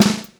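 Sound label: 128 groovy snare drum 2; Funk-Groove Drumloops; USB Soundscan vol.38; AKAI; 90 s Sample CDs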